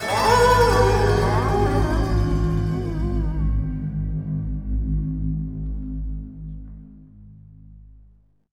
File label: The Selkies Spell Break; burly men at sea ios macos ps vita ps4 switch windows gamerip 2016